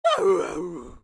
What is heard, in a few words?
dog exclaim